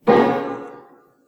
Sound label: piano